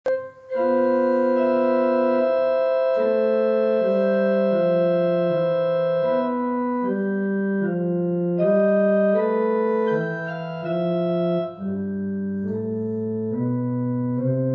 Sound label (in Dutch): improvisaties orgel